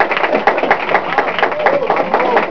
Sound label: CLAP CLUB; PEOPLE